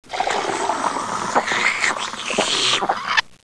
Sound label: pour glass